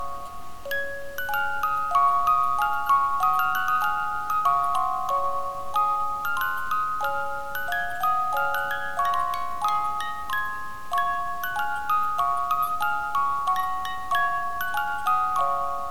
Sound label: Deck the Halls, Music Box